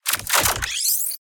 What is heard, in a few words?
Sci-Fi Mech Sci-Fi Reload; David Dumais Audio; Sci-Fi Weapons Pack 1